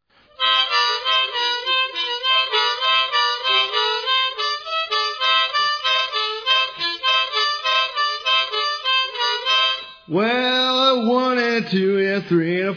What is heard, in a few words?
Harmonica Broke